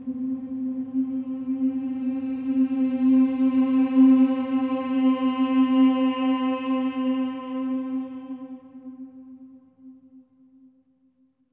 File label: MM C4, Symphony of Voices, swell; SWELL MMS